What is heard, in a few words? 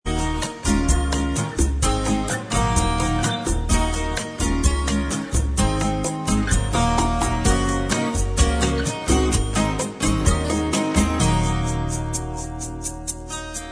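bossa, instrumental track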